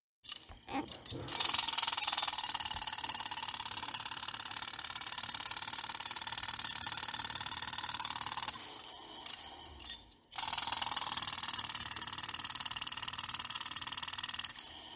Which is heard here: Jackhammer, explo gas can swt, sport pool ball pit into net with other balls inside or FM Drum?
Jackhammer